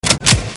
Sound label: Reload; Celebrities; 80sMovies